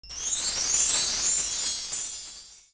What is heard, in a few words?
Sfx Sparkles; the princess and the frog; Swamp; Twitch Skijump